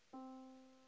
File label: Yamaha Disk, piano sound